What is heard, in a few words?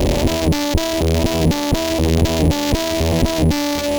Guitar Loop, 120BPM